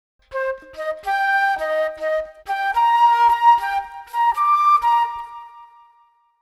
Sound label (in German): lautes Floeten Djingle